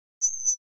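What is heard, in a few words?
Flash Beep Double Electronic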